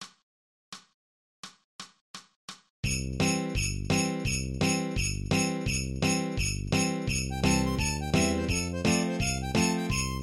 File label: Jingle Bells